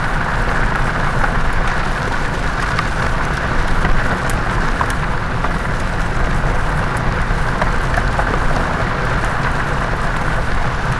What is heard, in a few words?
surface tire dirt loop; veh surface tire dirt player2 loop